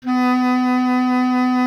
B. Clarinet; Miroslav Vitous - Solo Instruments VOL-1; 90 s Sample CDs

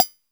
Triangle Mute, Percussion; Roland BK-5

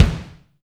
ROOMY KIK; NATUR. K1

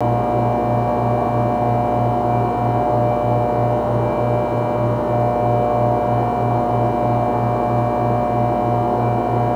room tone

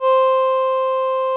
VP-330, String Machines

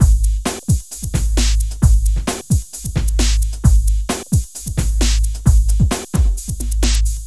Beat, Drums, Eclectic Electronica

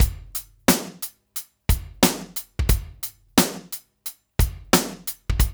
DRUMS, Loops; East West

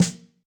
Snare, Snare; Yamaha DTXpress-II

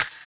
Wood, Block Stick; Equipped Music; Loungin House

